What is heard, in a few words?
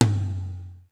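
mid hall tom; sr16 tom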